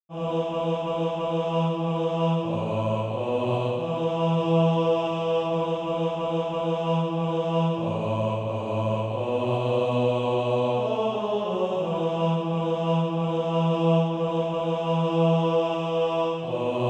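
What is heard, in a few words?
bass, Practise Music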